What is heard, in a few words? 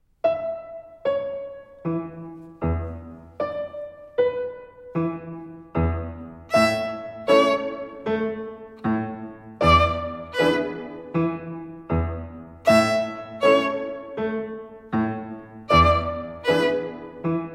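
The Cuckoo Song, Violin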